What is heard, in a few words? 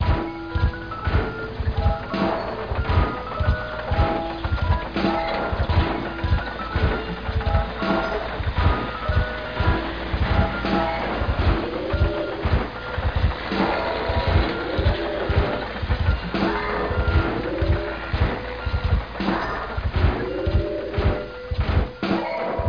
song loop; Stay Tooned Demo